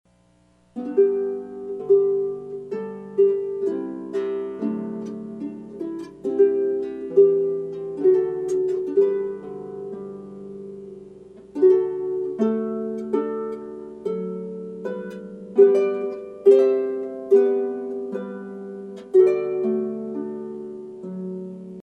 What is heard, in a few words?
Irish Melody, celtic; Be Thou My Vision; Celtic harp